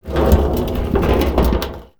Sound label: Drum